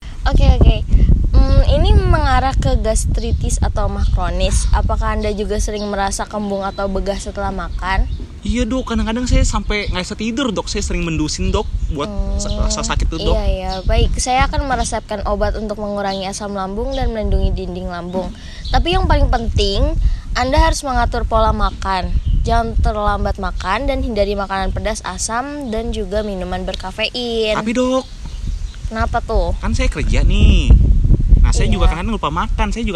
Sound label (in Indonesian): suara tabrakan